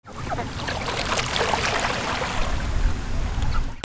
BIRDS

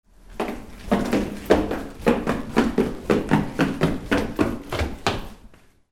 FOOTFALL

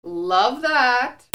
PEOPLE

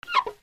SQUEAKS

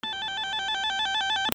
BUZZERS

